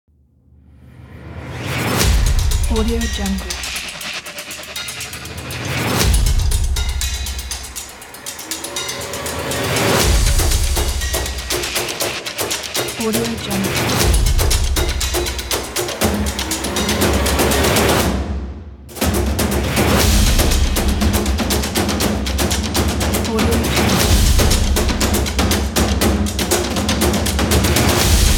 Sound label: aggressive explosive percussion trailer